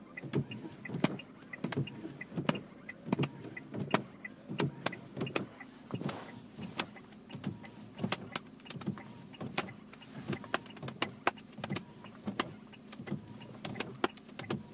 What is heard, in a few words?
making music in a car